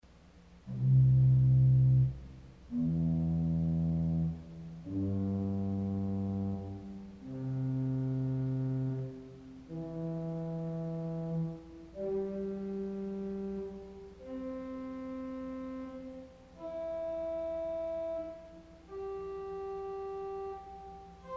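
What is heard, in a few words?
Geigen Diapason Arpeggio, organ stop; CulverAcademy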